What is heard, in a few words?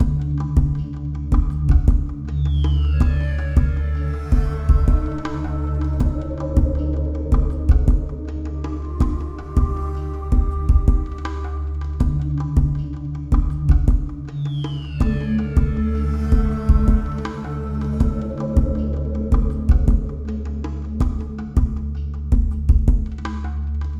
level 2 cue